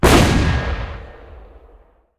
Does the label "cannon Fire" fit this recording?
Yes